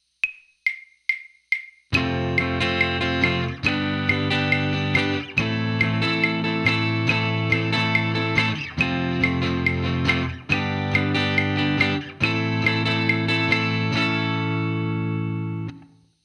practice chord

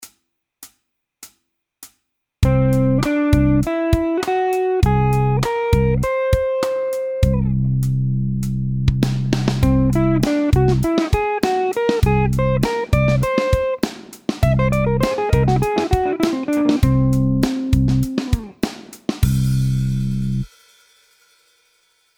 Scale C Whole tone

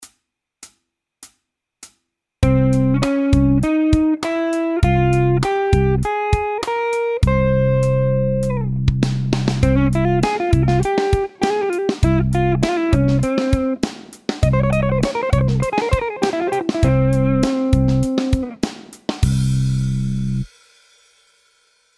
Scale C Spanish

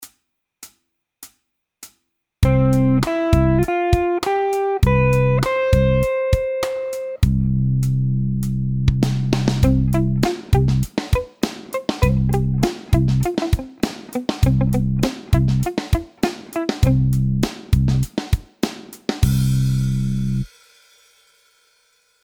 Scale C Ryukyu

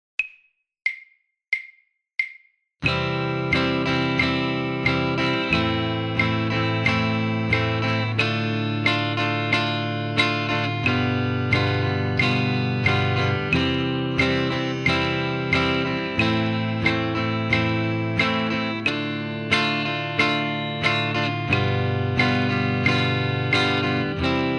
practice chord

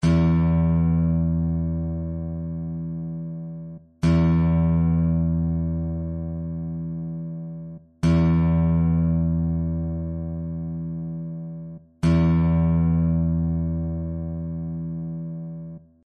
6th string

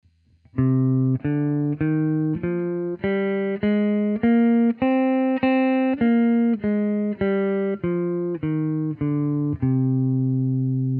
minor scale

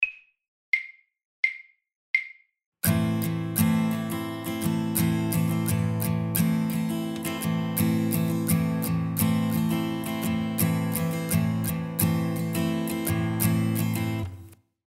16 beat stroke

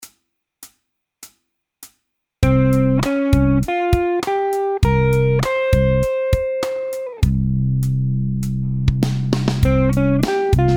Scale C Inonkai

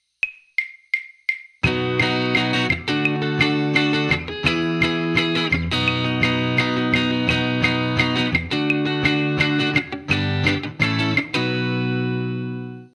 practice chord

